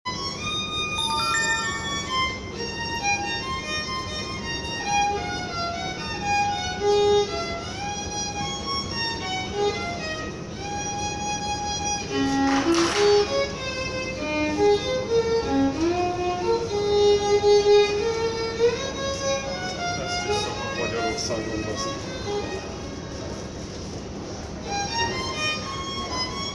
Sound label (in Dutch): valse viool